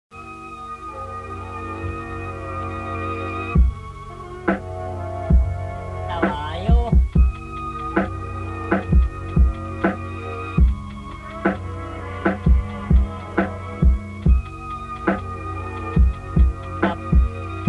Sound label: jazz